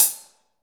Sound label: HH hh; Best Service Gigapack 2